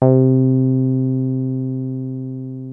303 C3, TB 303, Synthesizer

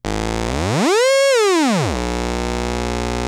ARP 2600 A#2, synthesizer; Hollywood Edge - Giorgio Moroder Rare Synthesizer Collection

